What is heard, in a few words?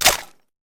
shotgun shell out; weapons; kuilu